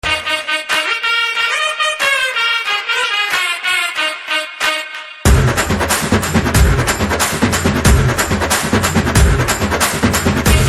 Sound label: Drum Beats; BGM